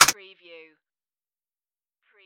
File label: keyboard